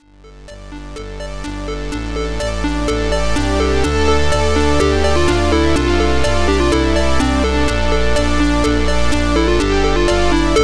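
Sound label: keygen song.xm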